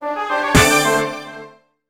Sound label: BGM Trap; Segare Trap; Irodzuki Tingle no Koi no Balloon Trip; NDS